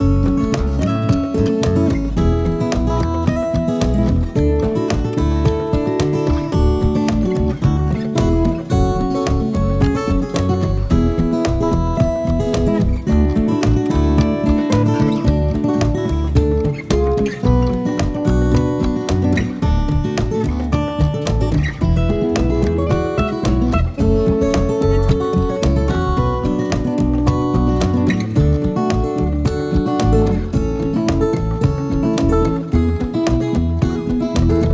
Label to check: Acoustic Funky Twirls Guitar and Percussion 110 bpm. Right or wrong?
Right